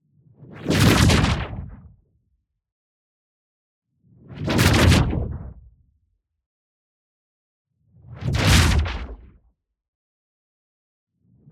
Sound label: WHOOSH LARGE Electric Sphere; boom library; Matter